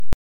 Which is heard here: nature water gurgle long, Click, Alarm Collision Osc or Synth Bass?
Click